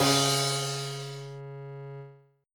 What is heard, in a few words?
cymbal